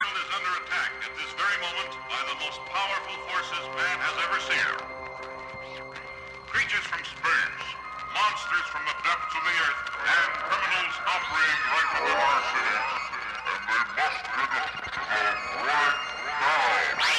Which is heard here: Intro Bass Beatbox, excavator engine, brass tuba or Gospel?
Intro Bass Beatbox